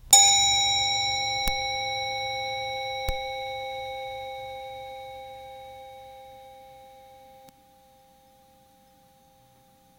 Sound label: minimal clean bell 1 time